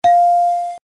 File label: ding